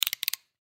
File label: Razor Knife Adjust, Razor Knife Adjust Blade Clicks; Hand Tools; INDUSTRY